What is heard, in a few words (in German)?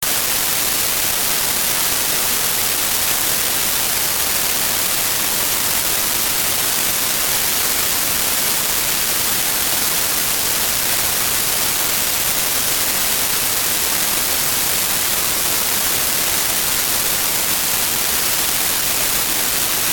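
Weißes Rauschen; 30 sek. Laufzeit; Ongsiek Haustechnik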